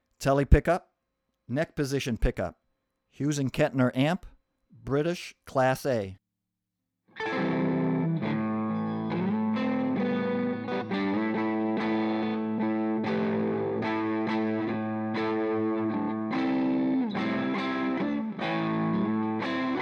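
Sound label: Telecaster neck, British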